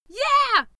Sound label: female cheer yeah